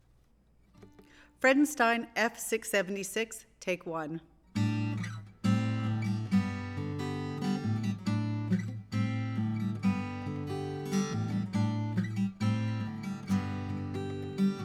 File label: Aco Gtr; mic pre shootout